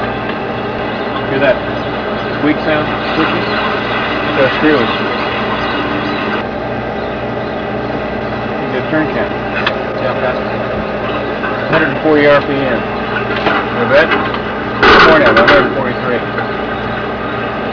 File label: screw turn count, The sound of Caiman's squeaky screw